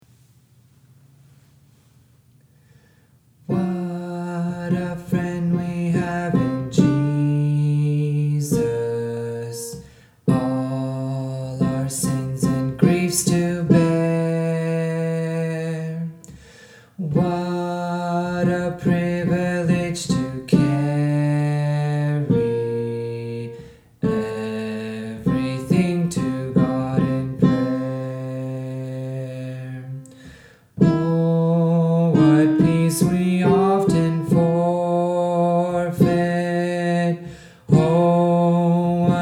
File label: Alto